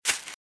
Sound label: Velociraptor - Footstep - Grass - Run - Right; RadwinIsland